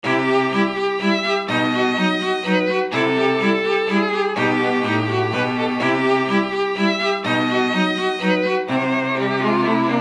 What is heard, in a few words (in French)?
trio a cordes en Do majeur